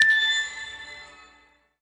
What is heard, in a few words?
candy Pick; fairyhansel